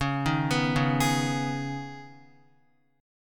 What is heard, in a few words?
Db 7th Suspended 2nd Db, down, Guitar; JGuitar